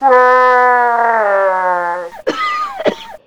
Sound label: zombie; fun and bullets